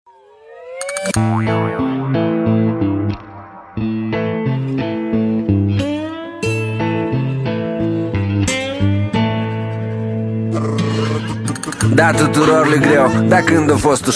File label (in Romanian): Muzica